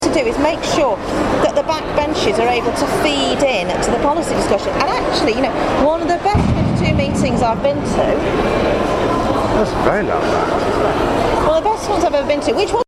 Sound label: portcullis pane crack incident